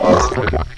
gurp; quake2; robot

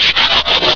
trans down; quake2; gears

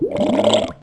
gurp; quake2; morticus

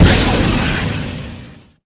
shoot, flare; quake2; zaero

